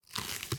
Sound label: bow back; smite; medusa